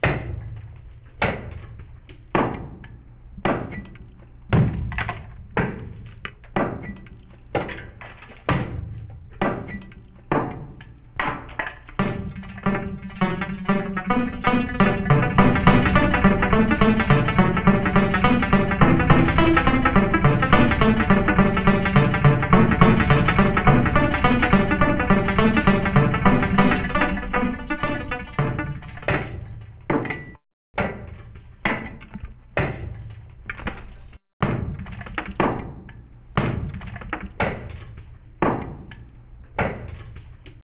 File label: banjo